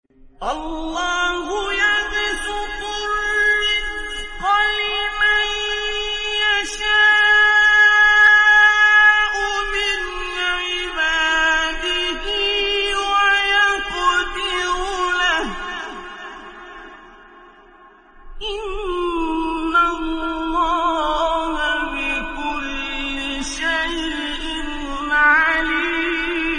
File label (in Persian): تلاوت آیه سوره; 62; عنکبوت; استاد عبدالباسط